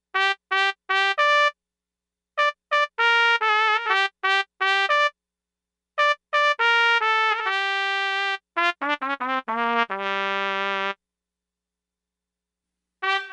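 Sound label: Echo Verb Sweep, Lexicon MPX 1; Factory Programs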